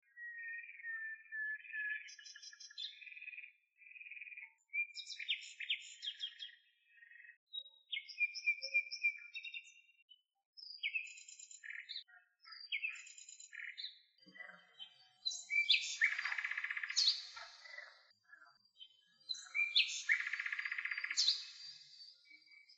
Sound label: birds and frogs